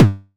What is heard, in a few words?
Synth Hit, Kick Drums; Drum Hits; Nu Dance Electro; Zero-G; Soundclash